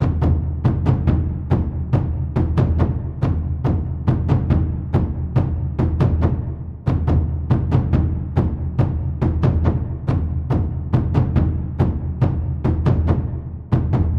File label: Loop Layer Taiko